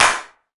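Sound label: CLAPS, Drum Machines; EdgeSounds; Drum Mashines VOL-1; X-DRUMS KIT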